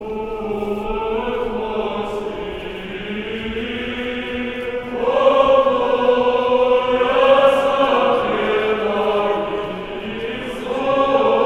Богоявление Ирмос 2 творца 1 песни; Путь морскии

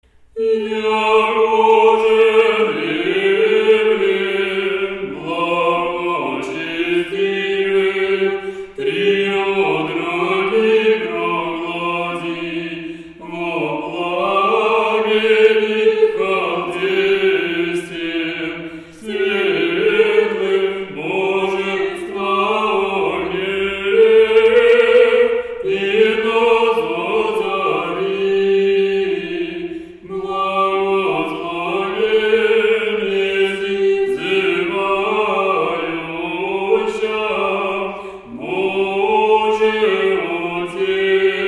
3 глас ирмос 7 песни

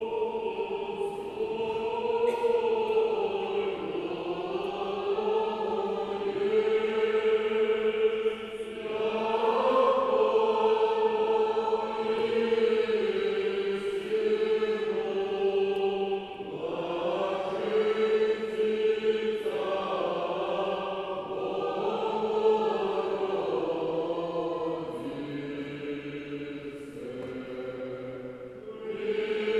Рогожской общины 1 глас. Достойно есть

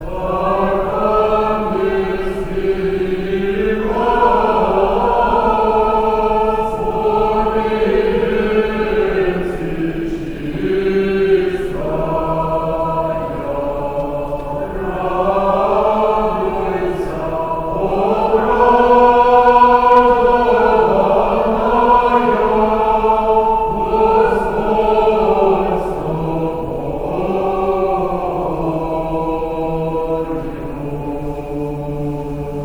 Рогожский хор Знаменное пение; Архангельскии глас; Величание на Благовещение

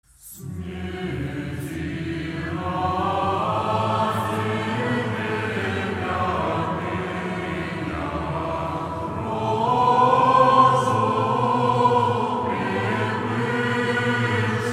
Фита. Ирмос Воздвижению Креста. Глас 8 песнь 9; Снеди ради

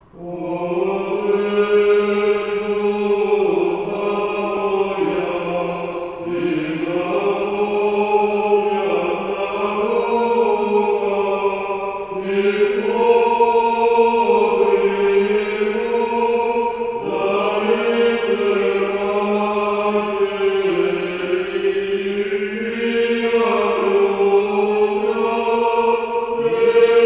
Благовещение. Ирмос 1 песни; Отверзу уста моя

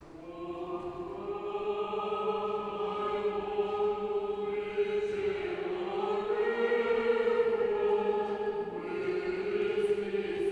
Рогожский хор 5 глас ирмос 6 песни

